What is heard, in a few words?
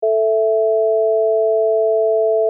mono add, acoustic